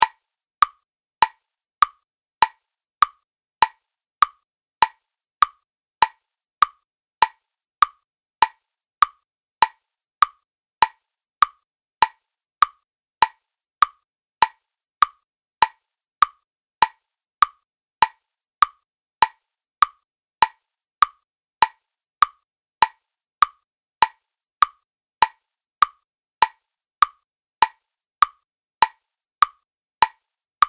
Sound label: Classic Timer Seamless Loop, beep sound effects